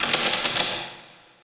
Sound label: locked echo; lucius